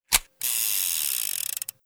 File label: revolver reload